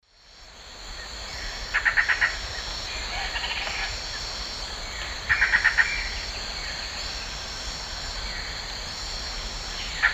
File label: Cercomacra cinerascens, alarm call; S. America; Eastern Lowlands